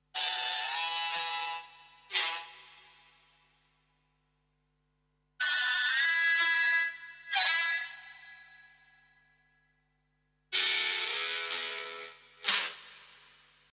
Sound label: Chant